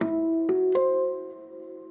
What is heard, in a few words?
ethno, 125, A